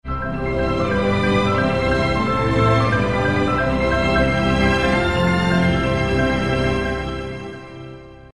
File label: saint saens symphony 3 organ; Symphony No. 3 (Organ): II; 1886; reverent